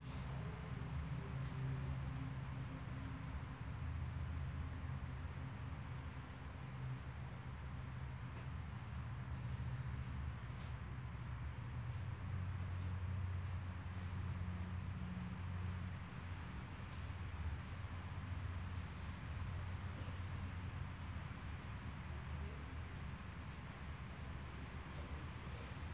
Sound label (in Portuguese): Ambiente Apartamento Noite ruido de ventilador e transito longe; ambiencia domestica